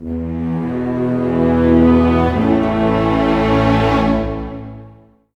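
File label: 108, orchestra; East West; Scoring Tools; Lonesome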